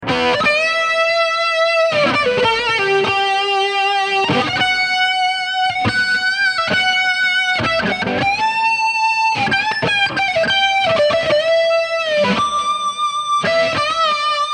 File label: Solo and pinch harmonics